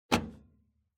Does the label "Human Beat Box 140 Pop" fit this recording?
No